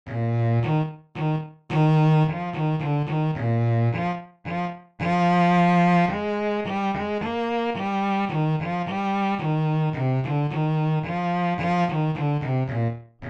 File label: Bass